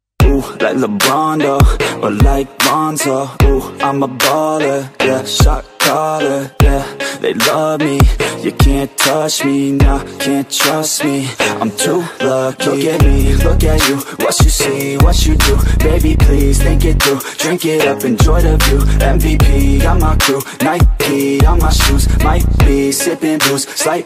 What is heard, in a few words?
hollywood romantic ringtone